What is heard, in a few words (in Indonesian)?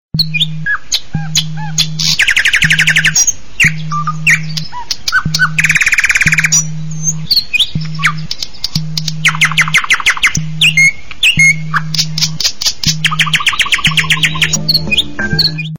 Burung Murai batu